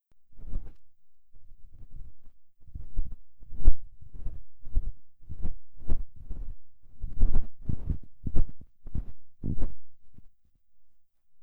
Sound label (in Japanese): 耳かき(右耳・左耳)